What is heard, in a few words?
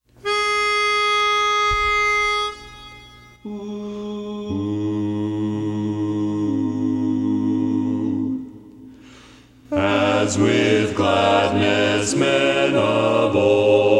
bass; as with gladness men of old